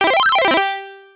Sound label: all lights on, pinball